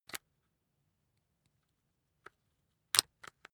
rock drill head attach soft; maschinen; bohrer; soundpool

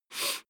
nose sniff male soft; koerpergeraeusche; menschen; soundpool

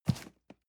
cardboard box medium grab; objekte

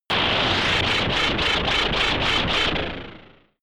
shot wobble noisy tension; pulsieren

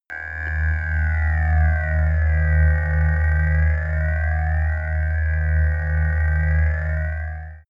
sci-fi ambient scanner low

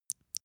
eye wink, comedy cartoon; koerpergeraeusche

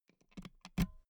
pull out euro plug; objekte; elektronik

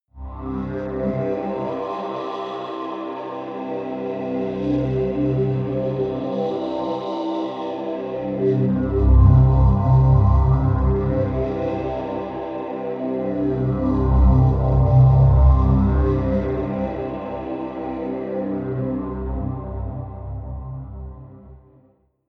pad sweep air; flaechen

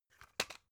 drug package drop; arzt

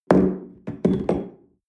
espresso upset tablecloth hard

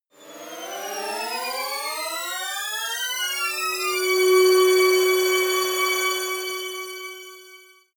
intro orchestra drop; risers

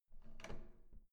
door handle up soft

